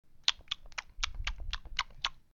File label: chewing; English Prep Package; RexDoesTricks